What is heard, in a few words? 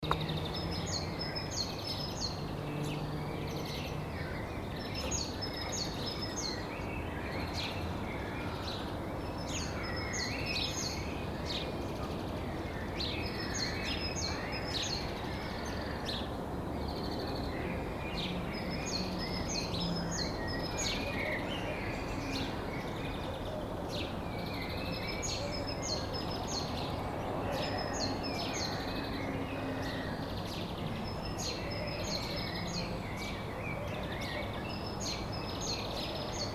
bird syphony